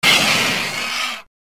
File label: Dakoraptor sound